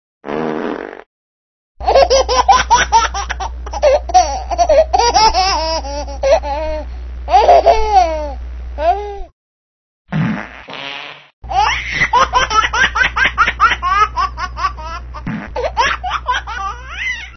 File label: baby fart laugh